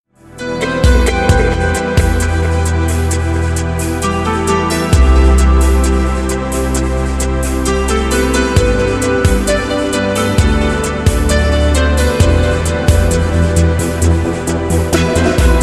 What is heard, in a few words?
Ringtones Mobile orkest light